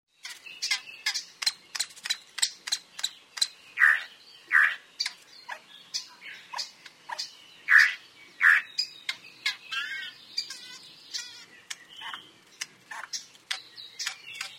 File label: akohekohe